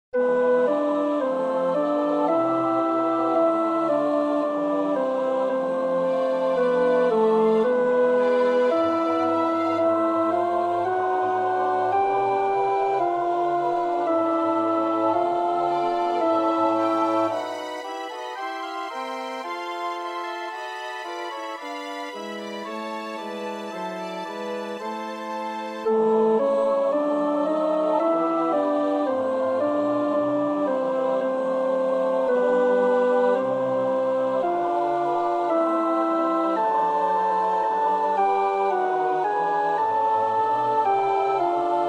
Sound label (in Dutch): alt, koorpartij oefening; Reger (Max); O Haupt voll Blut und Wunden